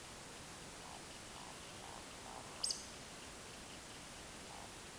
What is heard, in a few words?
Scolopax rusticola